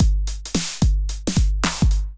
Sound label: 909 Kit groove 100 bpm; MIDI Clips; Flavour; Ableton; Drum Essentials